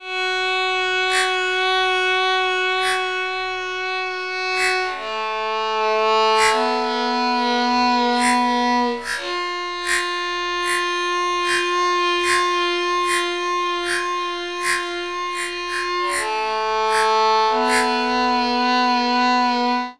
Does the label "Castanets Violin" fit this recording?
Yes